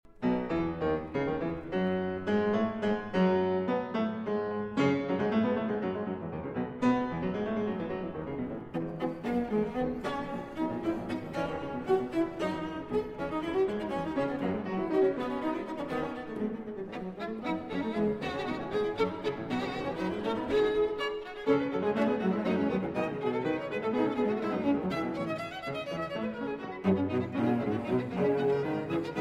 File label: Piano Trio in A Minor; Op. 50, TH.117; Tchaikovsky; Var. VII